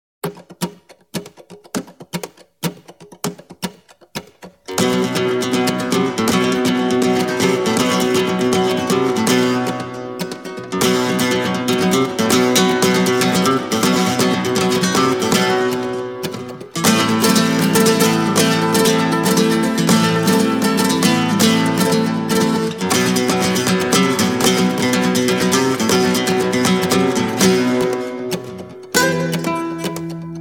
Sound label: Pure Flamenco